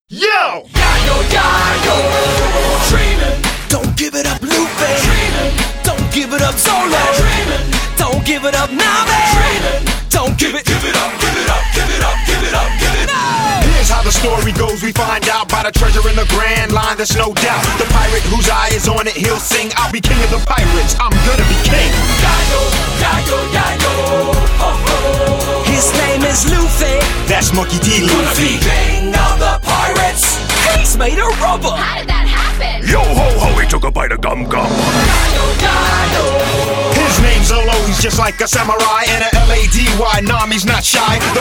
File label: opening theme song english; anime; one piece